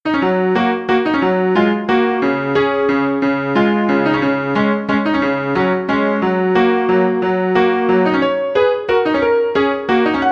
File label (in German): Weihnachtsflohwalzer